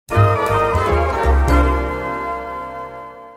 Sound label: music stinger player respawn; Family Guy respawn